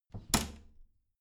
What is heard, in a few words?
bathroom cabinet doors close heavy; objekte; tueren